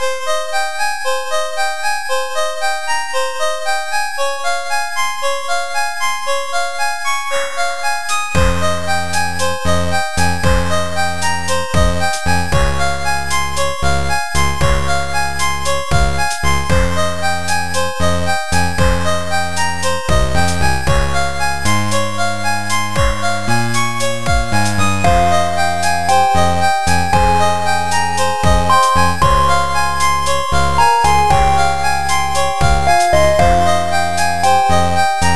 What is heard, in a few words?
Forest Bgm; Adventure in the Tower of Flight